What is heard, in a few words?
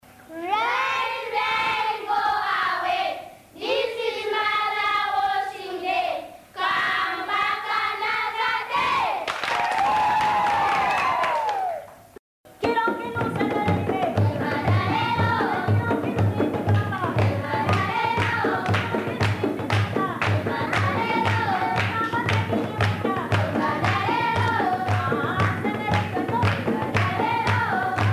Rain Rain Go Away